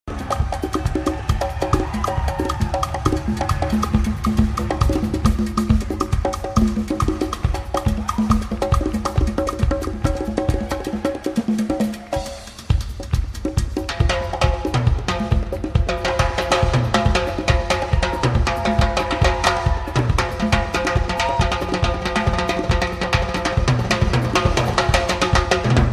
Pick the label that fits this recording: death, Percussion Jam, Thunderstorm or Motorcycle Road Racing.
Percussion Jam